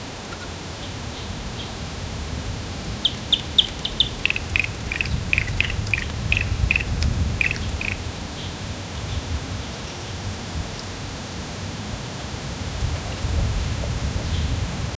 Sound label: soft tropical birds chirp; gentle wind